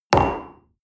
coffee place table hard short

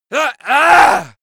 male die; soundpool

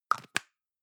plastic can lid screw close medium; objekte; dose

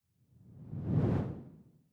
whoosh simple incoming low; swooshes-swishes

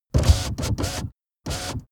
car window back open unsteady

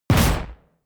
sci-fi musket fire zing; schusswaffen

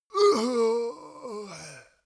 male death weak; schreie; menschen; soundpool

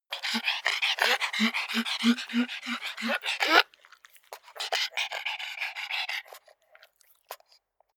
pug pant smack; natur-tiere; hunde

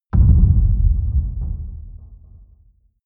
boom low impact; hits explosionen

